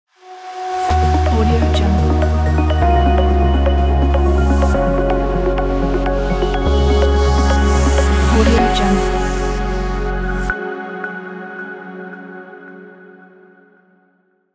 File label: safe landing logo